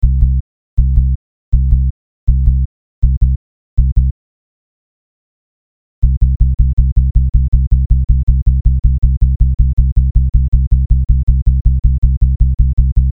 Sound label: Bass